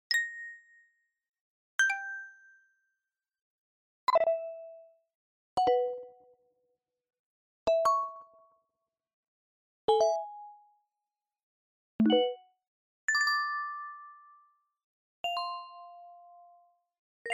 Smartphone UI Sound

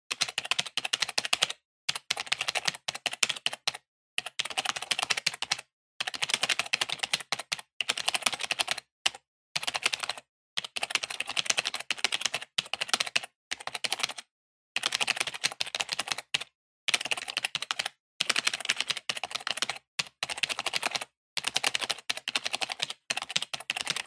PC-Keyboard (Hard)

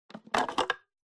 Pencil Sharpener Open

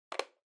Calculator (Battery Insert)

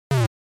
NES RPG Wall

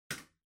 Light Switch Wide Panel Far Dry Hard

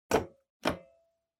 Intercom Door Station Button

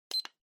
Stopwatch Digital Split Reset Gentle

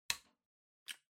Light Switch Wide Panel Dry Gentle

